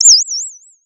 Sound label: Sound of a bird tweeting nicely